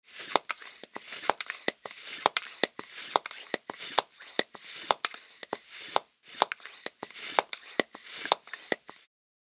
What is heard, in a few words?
Bicycle air pump